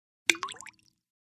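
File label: Splash Small Plop Glass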